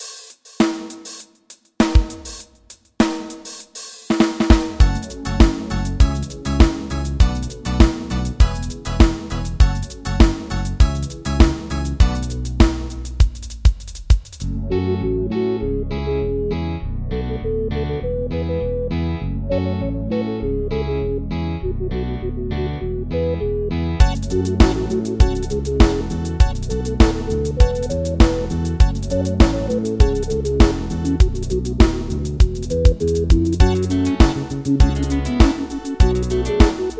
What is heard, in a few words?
Samba House Reggae